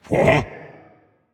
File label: notice